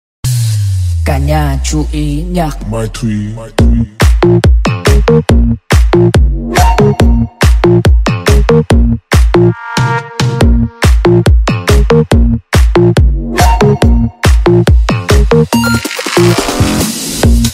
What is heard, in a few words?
remix TikTok